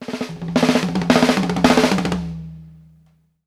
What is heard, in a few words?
DYNAMIC, drumworks fills; Sampleheads - New York City Drumworks VOL-1